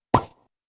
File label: whatsapp msg; ringtones; sms